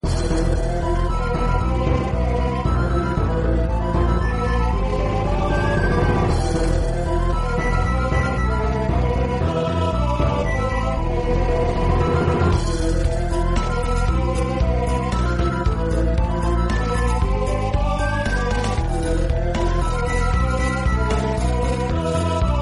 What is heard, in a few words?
Tunnel Ruins